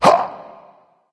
grunt jump dragon; fafnir

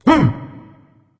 grunt jump; Smite; cerberus

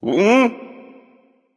confused; smite; hades

